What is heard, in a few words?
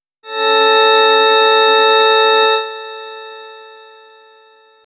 weather alert; alerts